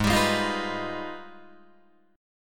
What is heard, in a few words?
Ab Minor Major 13th, down, Guitar, DADGAD